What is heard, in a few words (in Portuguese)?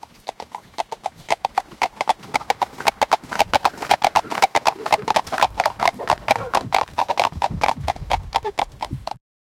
3 renas a cavalgar, horse hooves